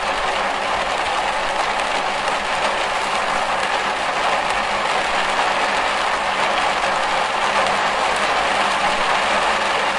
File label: inside GA rain, ec145